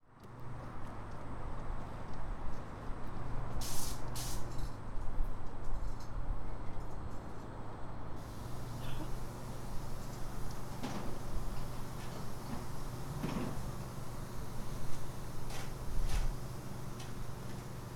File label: watari decontamination